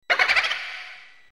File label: boo